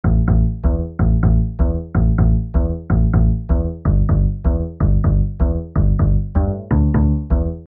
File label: bass